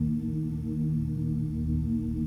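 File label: ui holograph ambience humanoid loop; Stellaris; paragon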